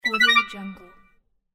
announcement sound effect

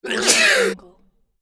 the sneezing

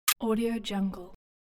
latin american percussion guiro

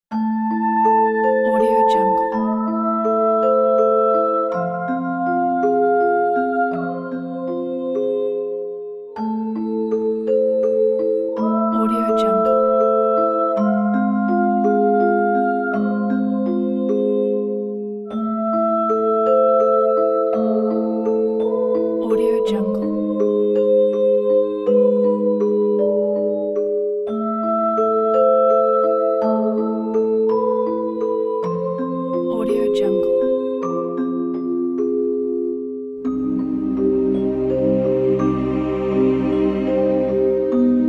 the horror cinematic lullaby

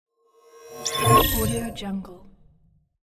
cosmic whoosh; moonaudioproduction

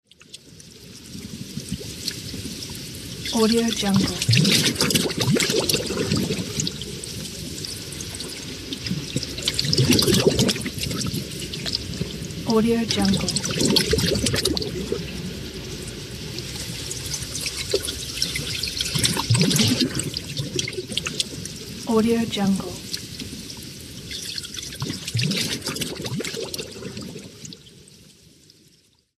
gurgling water

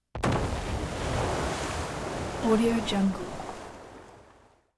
wave spraying; prosoundfx

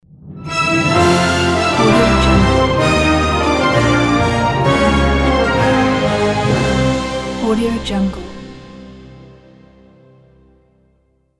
orchestral logo

